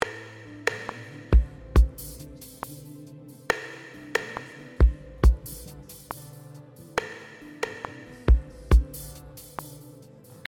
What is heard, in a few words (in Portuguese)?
Loop verso